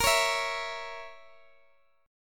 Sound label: A sharp Diminished Guitar Drop D, chord